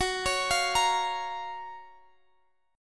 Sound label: Gb Major 7th, Guitar Standard; arpeggiated; x,x,16,18,18,18; JGuitar